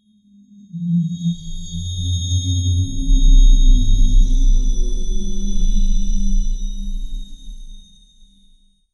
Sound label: trippy creepy ambience